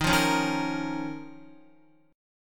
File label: Eb 7th Suspended 2nd Eb, Guitar; strummed; chord